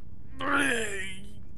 blargh; bionicle

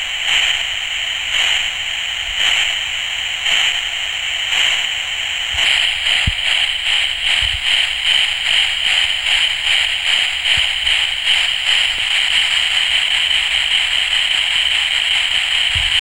sound steam

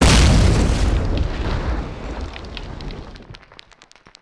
explosion

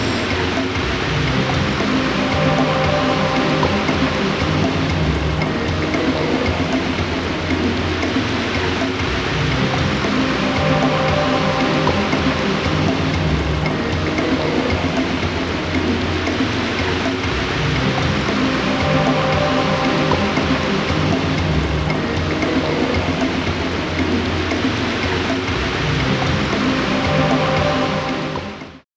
water

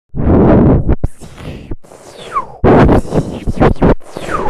air light

